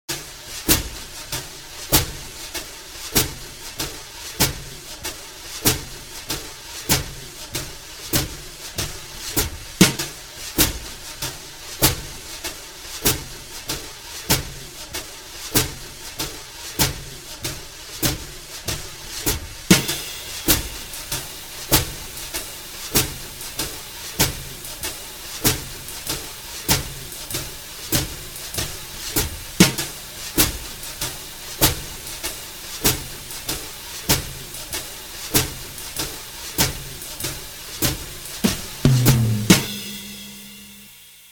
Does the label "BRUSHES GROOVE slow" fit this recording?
Yes